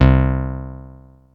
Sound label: Bass Synths hits and octaves